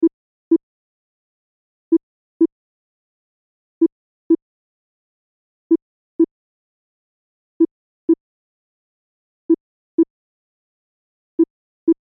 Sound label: Science Fiction Control Room Beeps; Control Panel; Control Panels